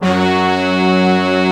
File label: FULL BG BRASS, SYNBRASS; East West; Global Sounds